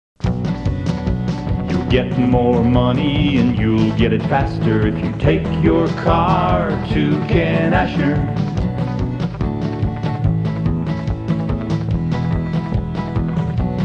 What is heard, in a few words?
Jingle